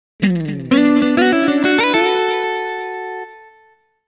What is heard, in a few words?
fanfare; COMPILE ファンファーレ; 同社の他のSTG（ザナックとか）でも流れるME; aleste; YAMAHA MU1000EX; Roland SC-8850＆INTEGRA-7